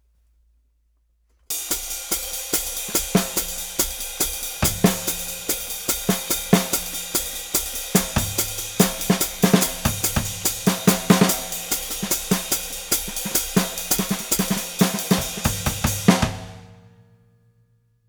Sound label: Jazz 144BPM